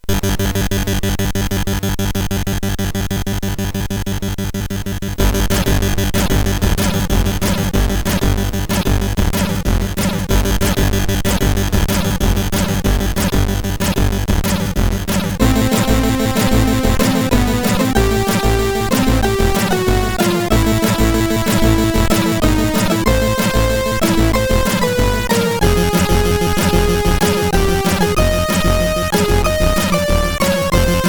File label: Remix sid CSG8580R5; 1 67YT-Turrican III